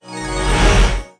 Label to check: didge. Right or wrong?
Wrong